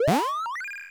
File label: MOVE; C64 Dreams; Multi-Sound Default